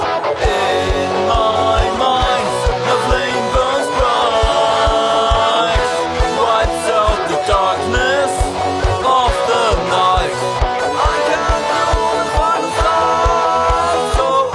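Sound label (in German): vorher, Handy Aufnahme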